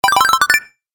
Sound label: Health Booster, video game